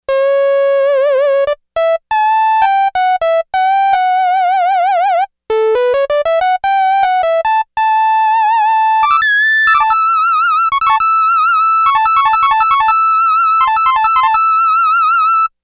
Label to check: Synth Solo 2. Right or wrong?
Right